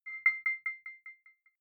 button echo resonance high; signale

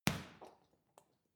boxing punching bag hit soft; boxen

